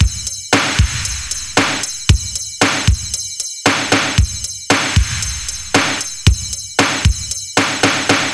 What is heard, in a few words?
Beat Heavy Clapper; Zero-G; Future Wave; Beats